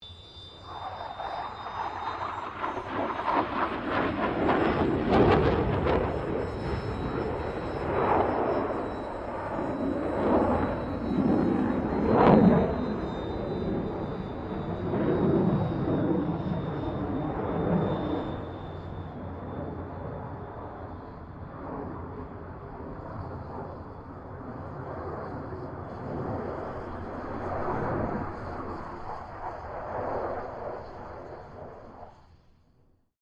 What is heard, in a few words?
AIRCRAFT FA-18 HORNET PASS